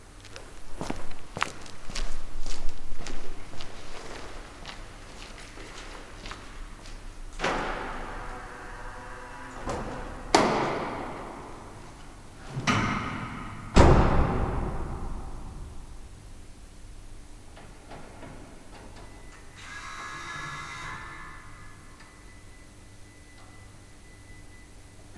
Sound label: Walking To Electric Car In Underground Parking Lot And Driving Away